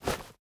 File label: remove one, bundle; Minecraft